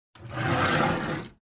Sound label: Opening old wooden sliding door